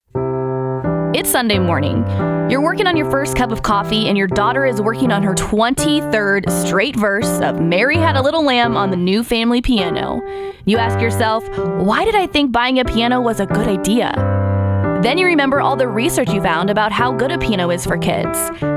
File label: PIANO